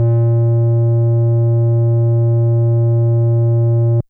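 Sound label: FM Sq Dist DX100 A#1; square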